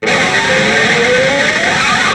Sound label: Scrape up